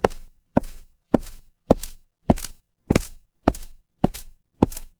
footsteps concrete

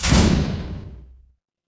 magic scifi portal

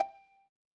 feedback merge

